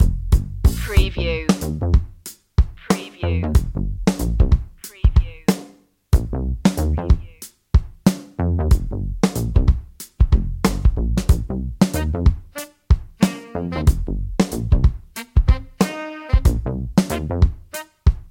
funky jazzy loop

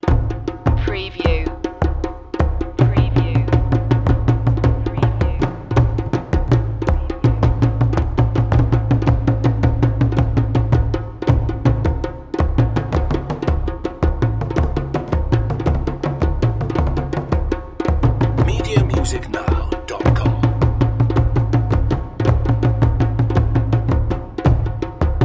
african drums fusion

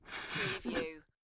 Shower Gel Bottle Squeeze; beanstalk audio

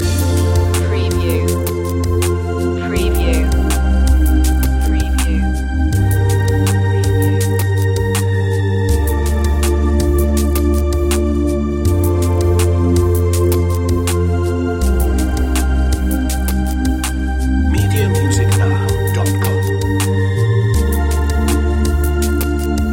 space wind loop

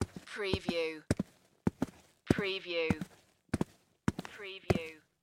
Footstep Tennis Shoe

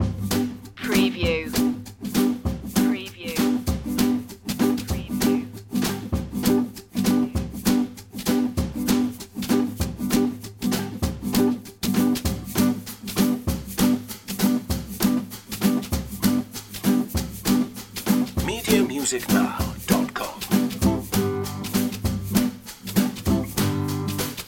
catfishin loop

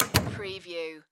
Door Close; sound-effects-bible

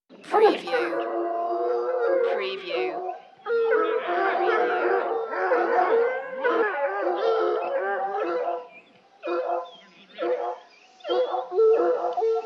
Dogs Hunting Pack Distance

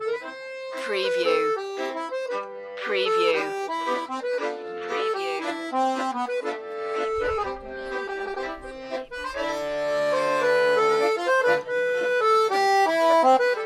french street musicians loop

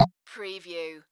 Multimedia Percussion

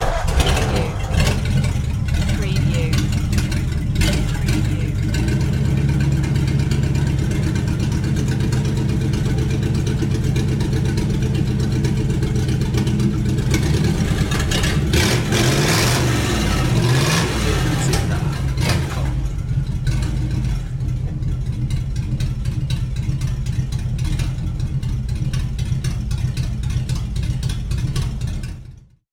Clssc Engine

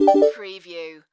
Slot Machine Musical Ditty

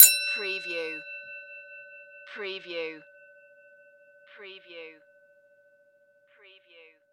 Bell Ring Hit; sound-effects-bible

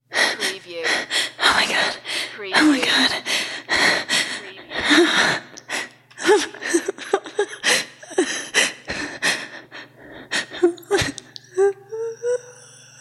Female Whimpering

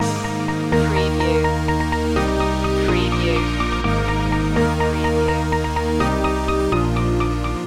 dance train loop

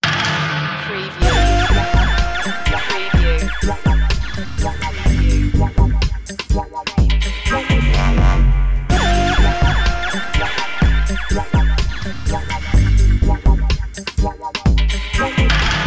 dubweed

